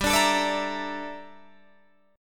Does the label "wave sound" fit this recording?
No